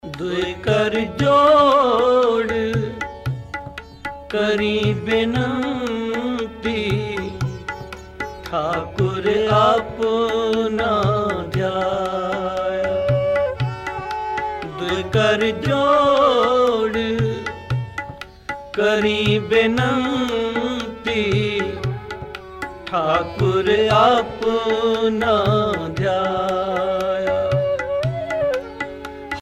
Sikh Ringtone

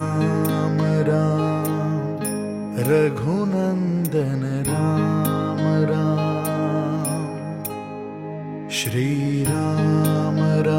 Shri Ram Ram Raghunandan Ram Ram Ram Mantra

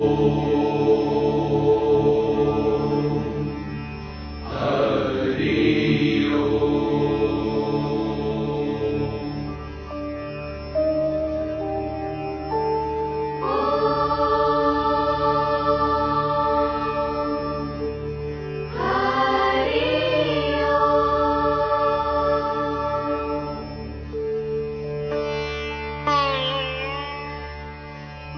Bhairavi Raga Meditation In Morning

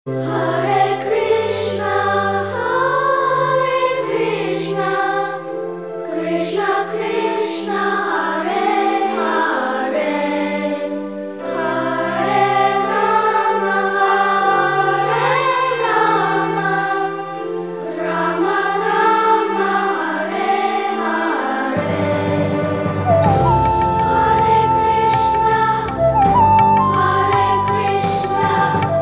nice krishna tone, ringtone

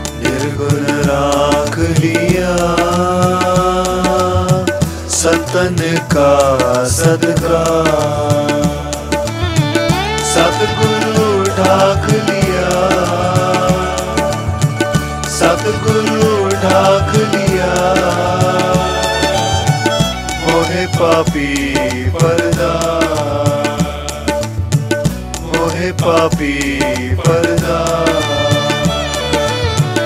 Sikh Ringtone

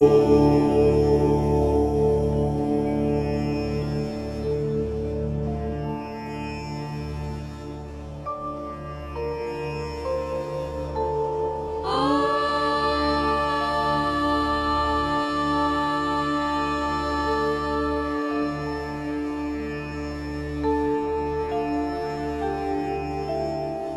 Meditation In The Night Raga Darbari

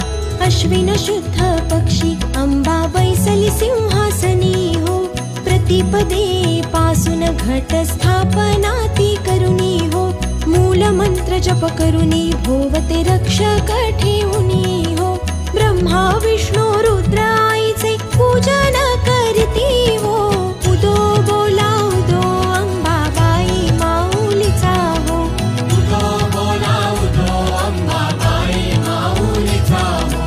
Navratri Aarti, Durga Aarti

